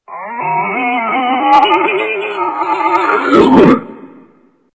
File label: death; freak fortress 2; grievous